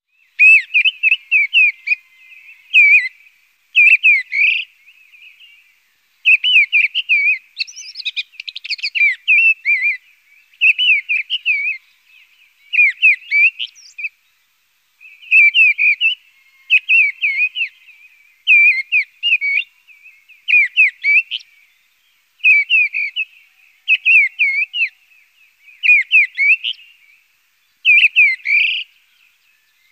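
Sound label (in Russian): deryaba golos, mistle thrush voice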